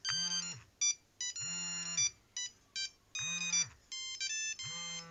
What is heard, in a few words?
nokia 5510 ringtones indifference